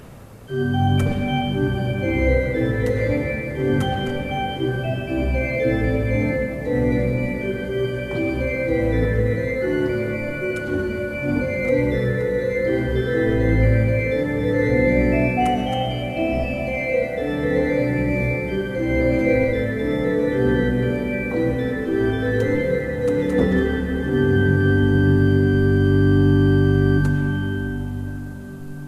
Niederlaendische Orgel

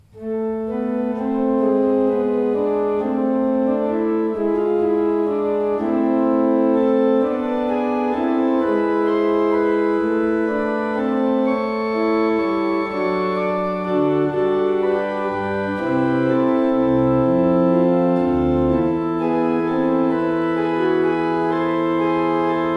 John Fearnley, pipe organ

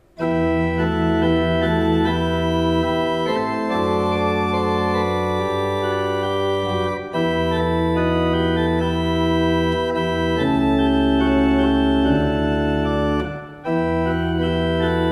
Kleuker, pipe organ

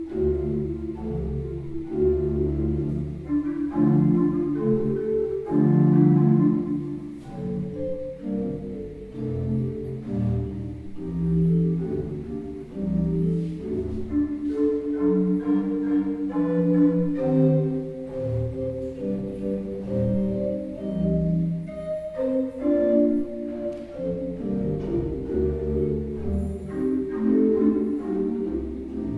pipe organ; Kleuker 15 II P

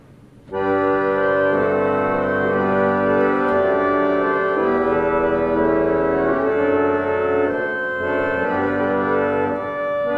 Hinkel Druckluftharmonium

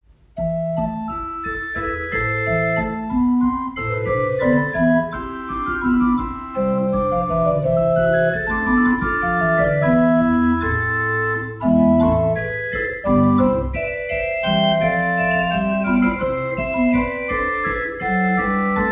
Mayer, pipe organ

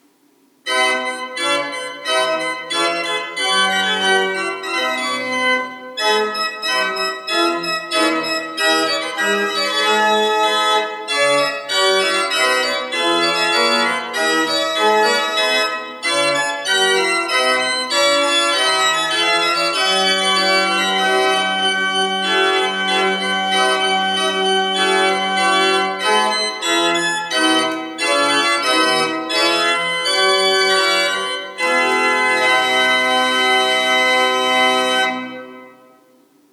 Seifert, pipe organ

